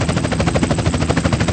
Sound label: heli sound